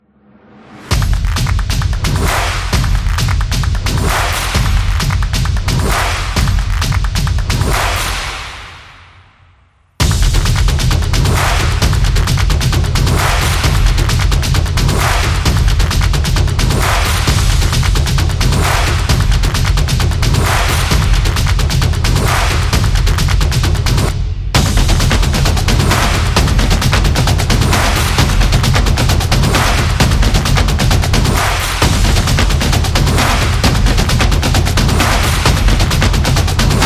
Drum Beat; football drums

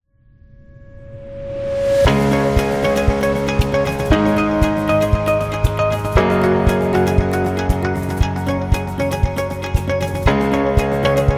Motivational Uplifting; corporate music vol 4

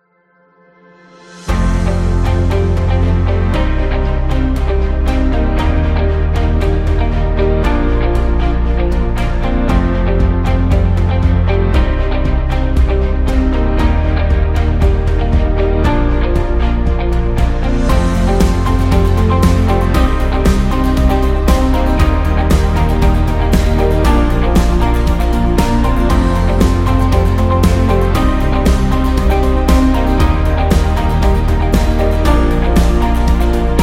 Motivational Rock

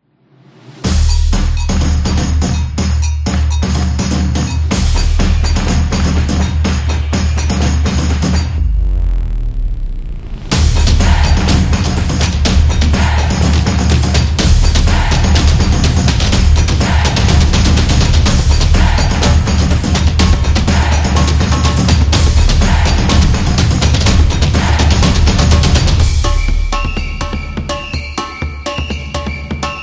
Soccer Drums; football music

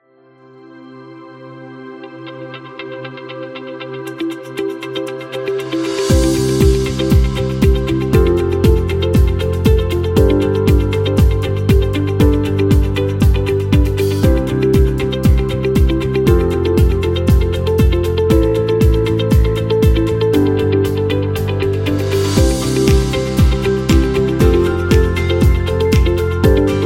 Corporate Chill; business background

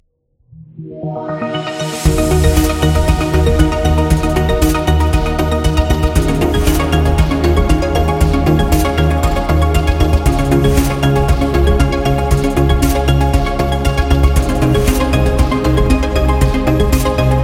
Technology Timer

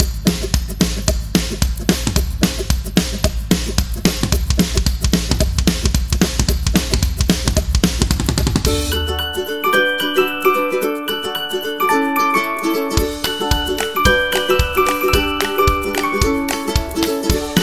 Cheerful Ukulele Song, happy music

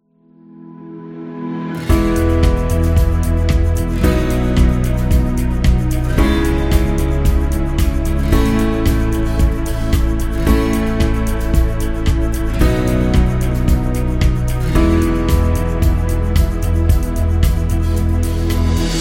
Inspiration Corporate

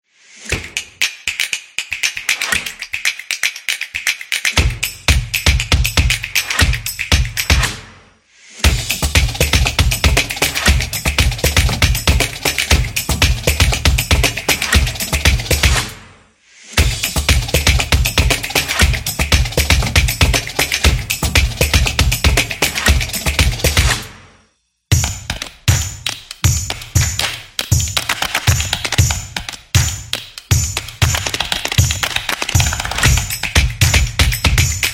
Fast Pace; crossfit

